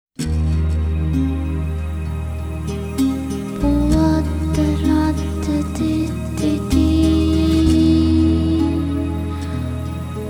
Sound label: Calm Melody; Radiata Stories Original Soundtrack